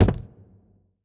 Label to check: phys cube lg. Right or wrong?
Right